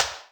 Electrik, Electric Snare, Yamaha DTXpress

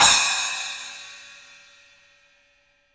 Studio Cymbals

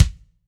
AM KICK, AMBIENCE KICK

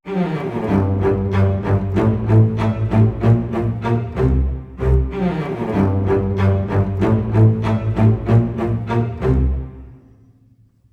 95 strings lo, Orchestral arrangements, Gmin; PowerFX - Downtown Orchestra

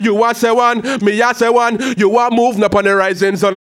HAL RAGGAM, RAGGA-MUFF A maj

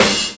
CRASHING; Univers Sons

